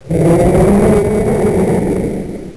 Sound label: Red Skull Die